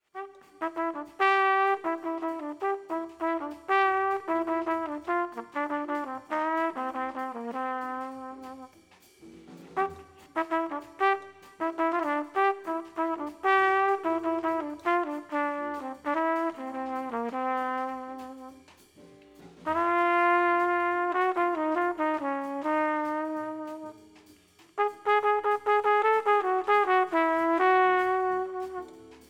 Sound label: jazz